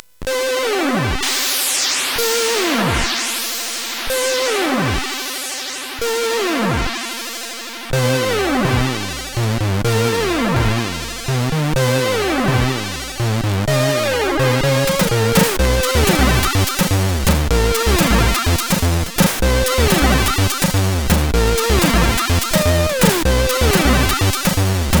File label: Arpeggio, CSG8580R5